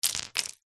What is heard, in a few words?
stones handful set down on tiled floor; foley